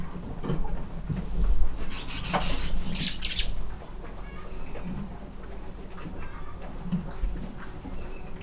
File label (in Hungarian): ló eszik baromfi; parasztudvar